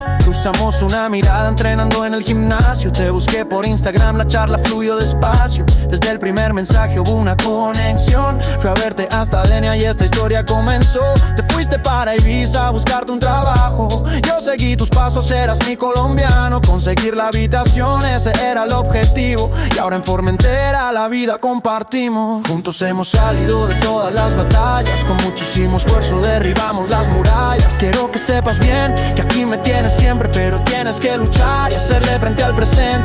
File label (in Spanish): rap